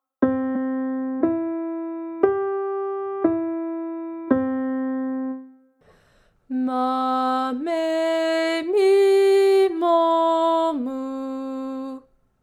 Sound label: Vocal Exercise